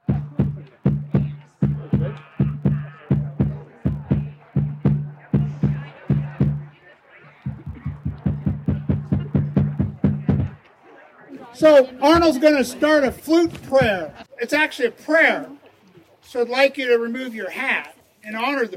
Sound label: Flute Prayer